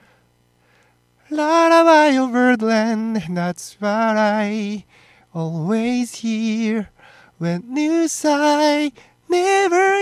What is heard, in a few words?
vocal before